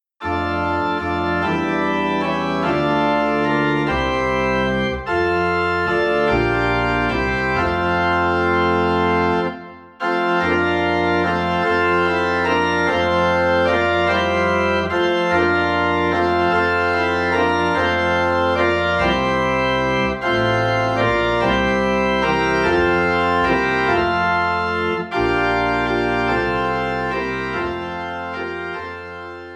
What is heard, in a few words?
Organ in F; Good Christian Friends Rejoice; christmas